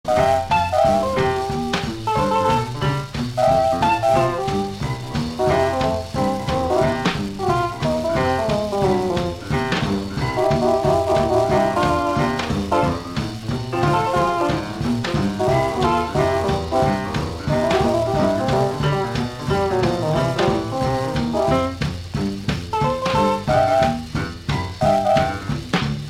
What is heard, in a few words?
Novachord Boogie; Jazz Magazine